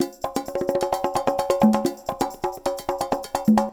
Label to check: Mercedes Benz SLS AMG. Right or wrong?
Wrong